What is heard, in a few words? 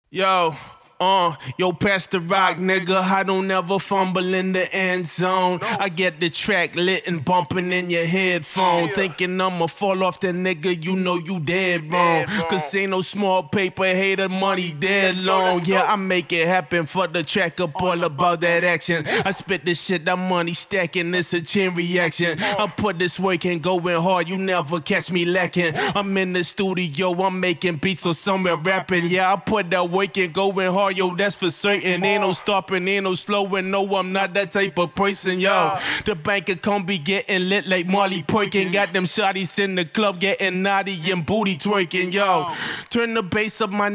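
hip hop, 143, G minor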